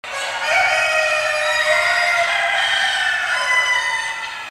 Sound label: rooster